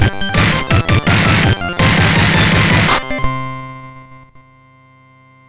MOS6581R2, sid

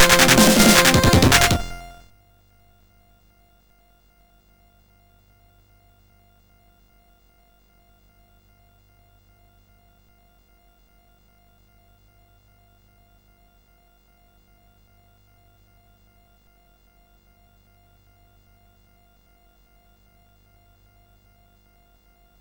MOS6581R4, sid

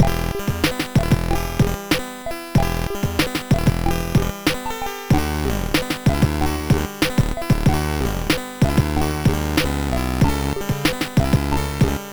sid MOS6581R2

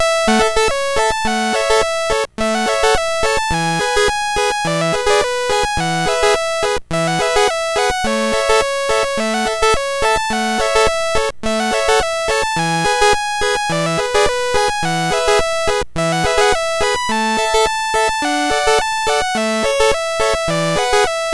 Red Nosed Reggae BASIC, MOS6581R4; Latimer Joey

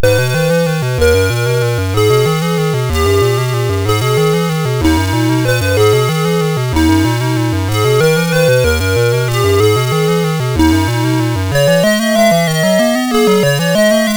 sid MOS6581R4; Dragon Skulle

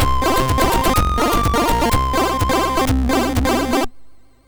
sid MOS6581R3; Yaemon; Twin-Down

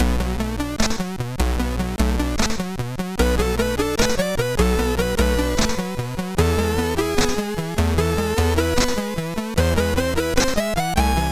Hymn, MOS6581R2

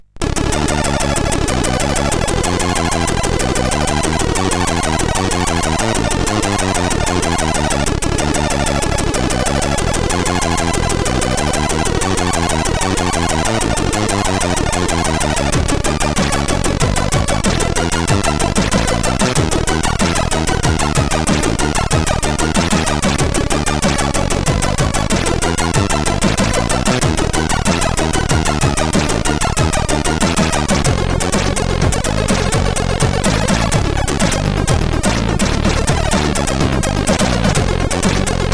sid CSG8580R5

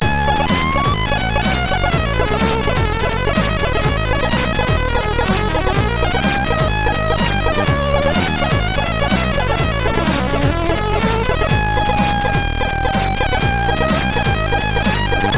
sid MOS6581R3

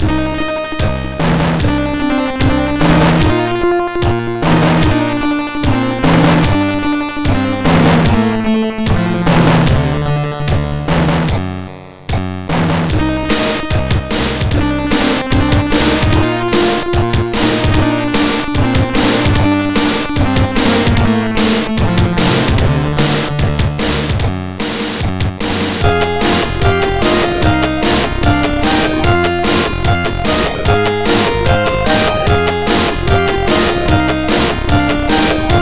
MOS6581R2, sid

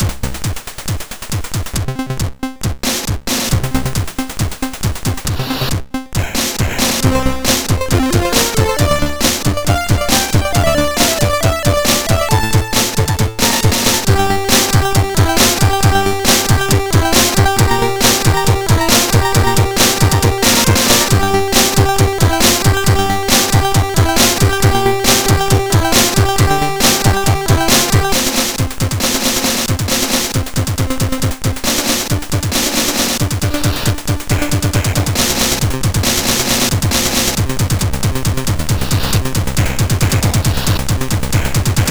MOS6581R4, sid

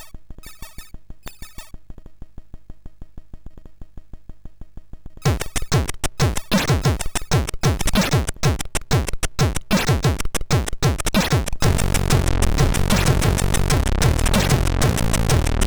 sid MOS6581R3

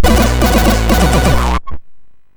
Double Sphere, MOS6581R2

